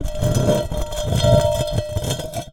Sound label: Drag, Vase